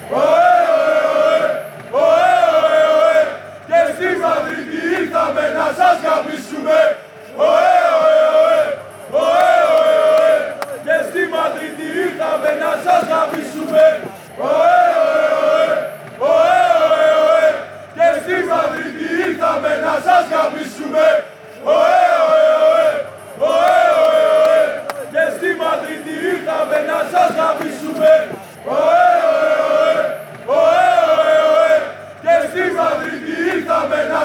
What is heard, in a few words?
oh ay oh ay oh ay, chants